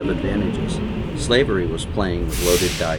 noisy

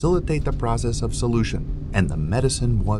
noisy

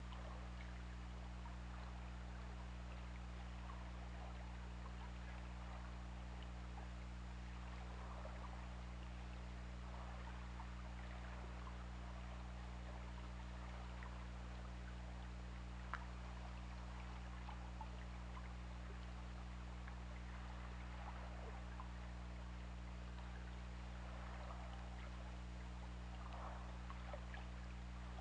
Orca; OrcaLab

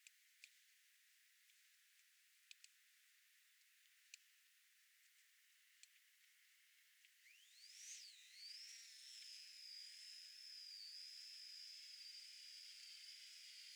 DRONES

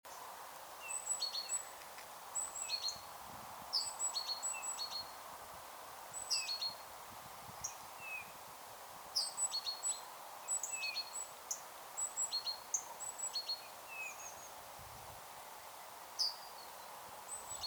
Pyrrhula pyrrhula; SETE

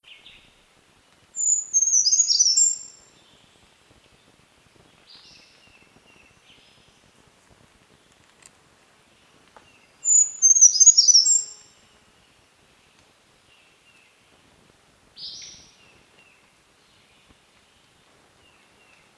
SONG, Brown Creeper, Certhia americana nigrescens

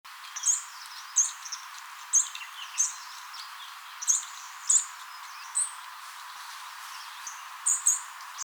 Sitta europaea; SETE

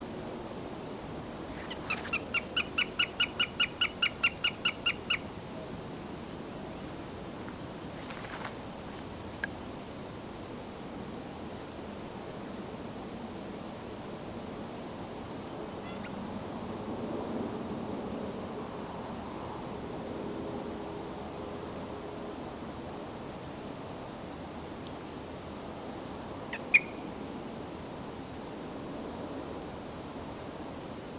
Garrulus glandarius; SETE

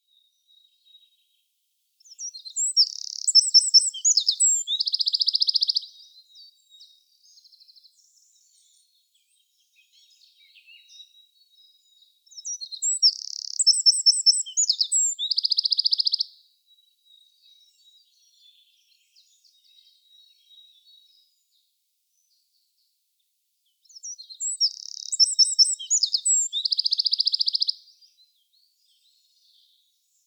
Troglodytes troglodytes; SETE

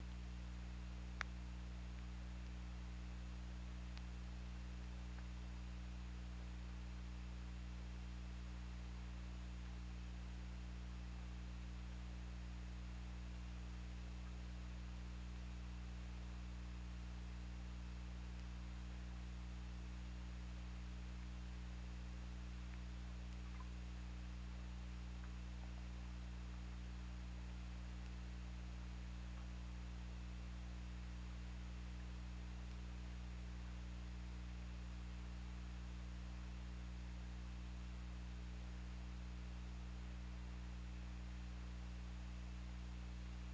Orca; OrcaLab

